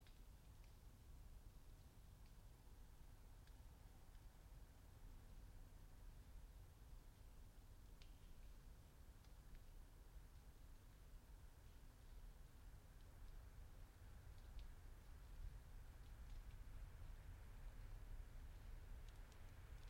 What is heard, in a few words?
yamanakako sound